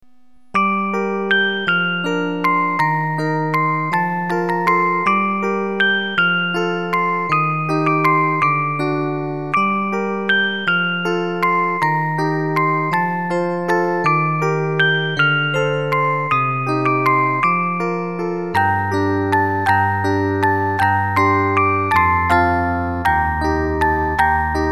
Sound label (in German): orgel